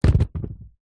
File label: rollerskate down on ground; sport